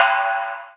MISC, Skanky Perc; E-MU Formula 4000 Series Vol. 1; Hip Hop Nation

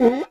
EQ Perc, Percussion Mix

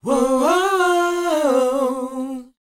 WHOA C, Vocal, R&B & Dance; ILIO; Vocal Planet

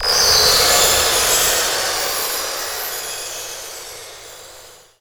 CYBER KIT, DRUMS

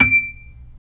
B-3, percussion; Keyboards of The 60's and 70's

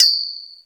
POLE, DRUMS; Crypton - DrumBase5000; T1 DRUMS

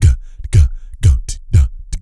GO BOY, Vocal Planet, Blues Soul; Spectrasonics

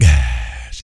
VOC PERC, BASS PERC

